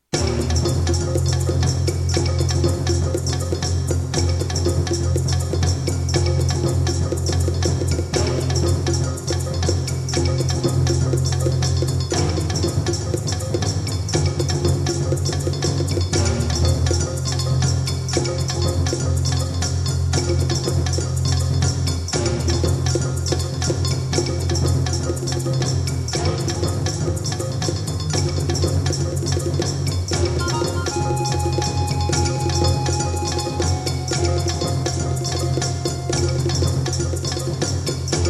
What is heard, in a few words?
Tribal